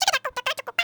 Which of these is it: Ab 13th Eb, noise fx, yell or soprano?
yell